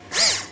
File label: leg out; captainbigbutt; carbon miku